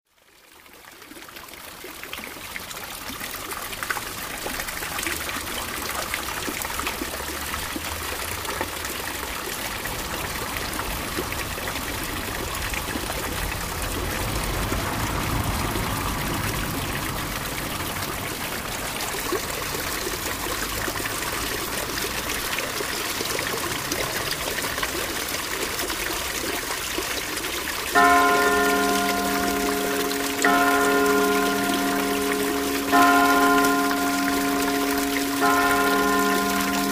fontaine place Saint-Etienne

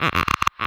Toc Groctococ gratté

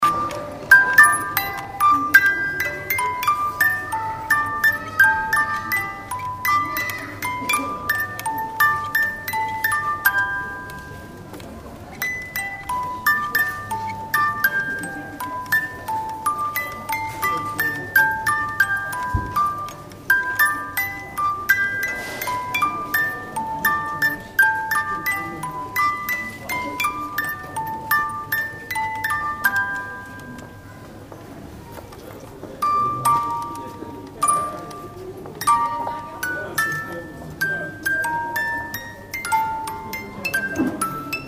Boite musique; Dagobert

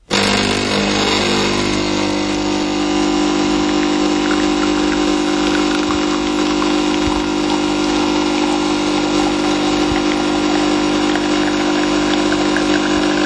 machine cafe

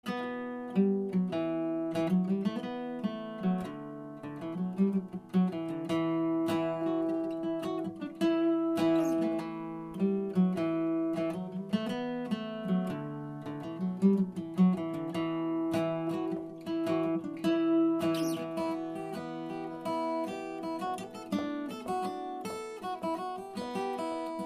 guitare; Warlock

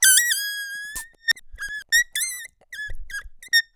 LOOP Couinement Toutou Baudruche Mono

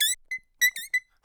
LOOP Couinement Toutou Baudruche Mono

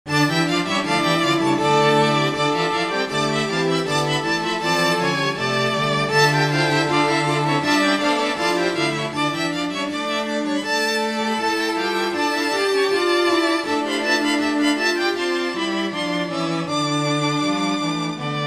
cordes choeur

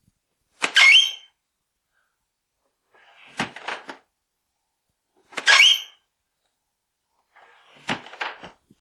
bruits porte

